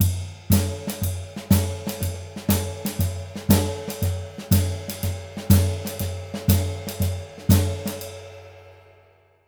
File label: 120 SHUFFL; Univers Sons; Jazzistic